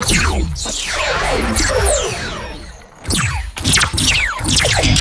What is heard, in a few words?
snappy synthetic sci fi laser blasts; lasers